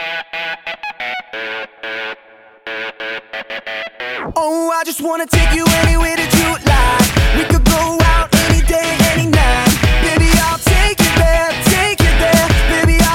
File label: Male Vocalists; Z-I-v Summer Contest 2013